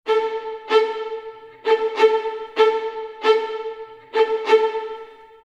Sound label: 95 str violins rhythm, Orchestral arrangements, 95 A min